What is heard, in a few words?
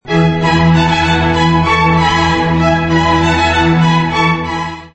premium, doorbell